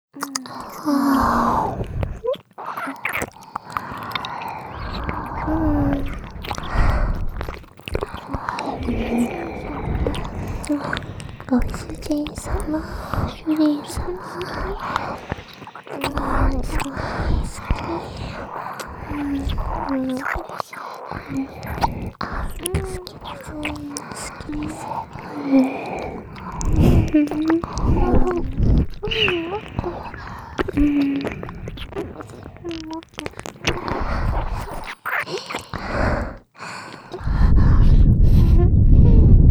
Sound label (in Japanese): ラブラブキス&左右耳舐め, ASMR